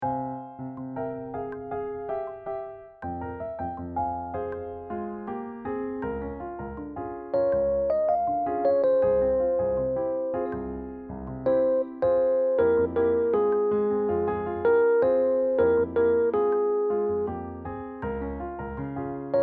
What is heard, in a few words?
duet, jazz